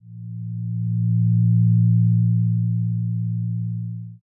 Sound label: zero g